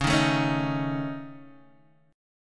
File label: C sharp Suspended 2nd Sharp 5th C sharp Guitar DADGAD, chord; x,4,1,2,4,1